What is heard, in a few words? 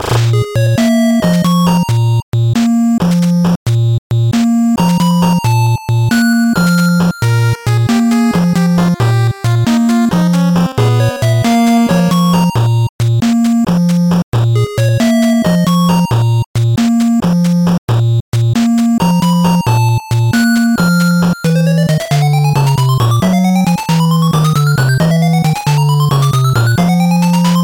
sword; rockman7